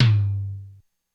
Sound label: Lo Tom, Korg DSS-1 Drums